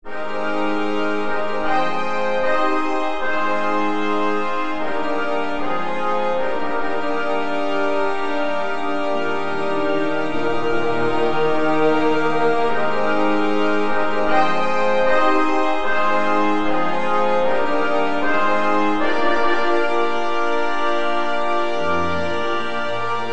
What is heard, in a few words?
Celebration Fanfare, Brass Music